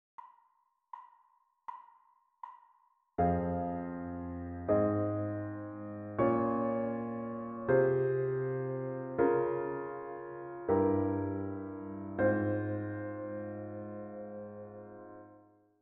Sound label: harmonic dictation